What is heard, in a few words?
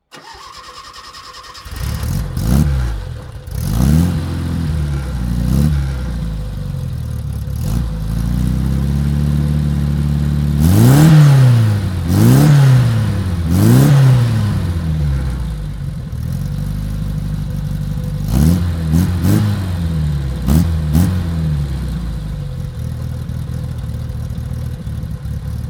Bertone Racer; Bertone Racer Berlinetta